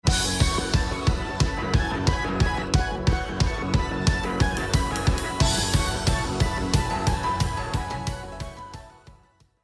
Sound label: round ten sec count; music kits custom